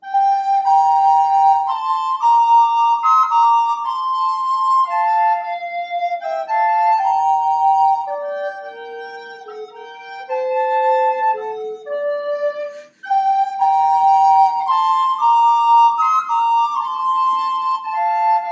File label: Greensleves